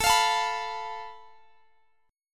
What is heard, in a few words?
F Major Flat 5th A, guitar chord